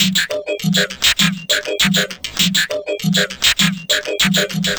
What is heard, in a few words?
Tuned Beat, Electronic Beats; Sonic Foundry (Sony Creative Software); Myths of Technology